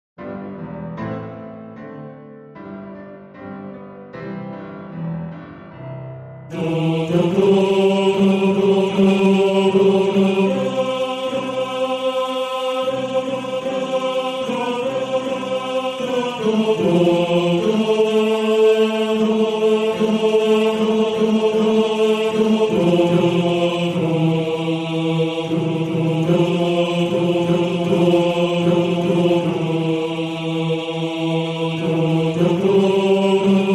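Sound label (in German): Tenor 2, Männerchor; Einsames Glöcklein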